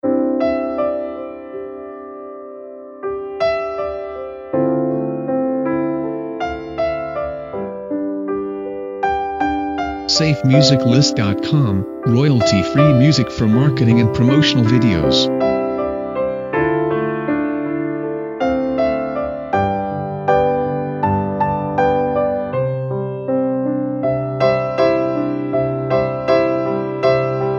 Jingle Bells Piano Solo; SafeMusicList

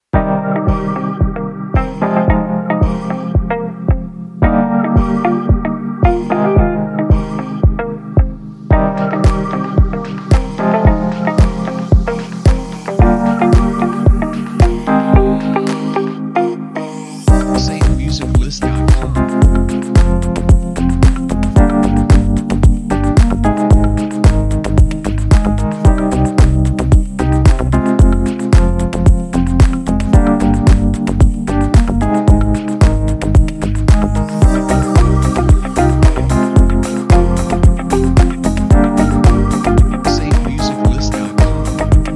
Beauty Lounge; SafeMusicList

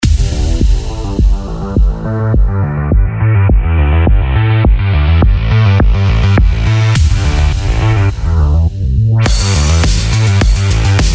Power Race Sport Electro; SafeMusicList

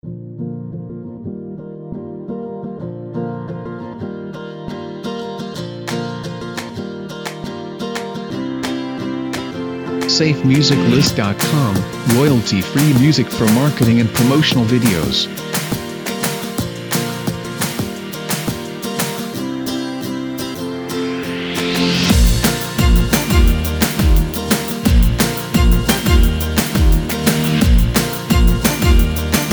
Fun Energy

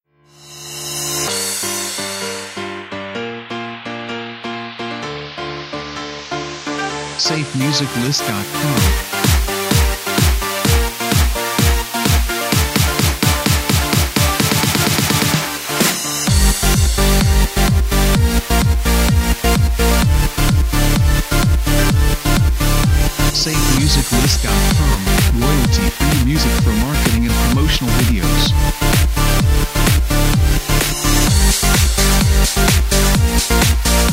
Club Dance Night

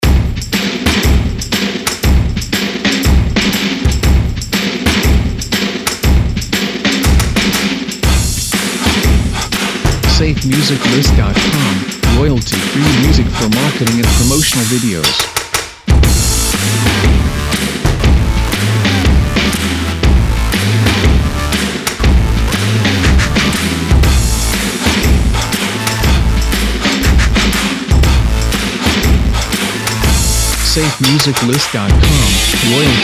Powerful And Hard Working

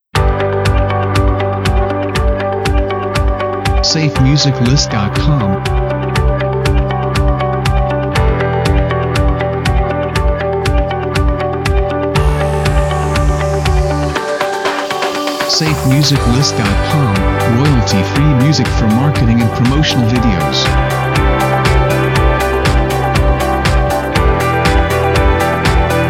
Positive News; SafeMusicList

